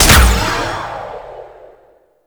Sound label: Archer Rain Of Arrows Fire; Warframe; Duviri; Dax Archer; Abilities